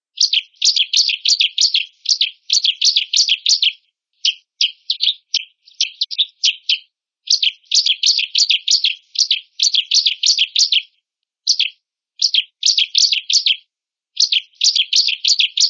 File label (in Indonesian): suara burung ciblek